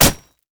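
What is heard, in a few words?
siege rider basic attack; minions; LoL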